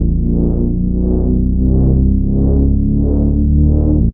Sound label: BASS Fat Boy Fatter; Polyend; Plughugger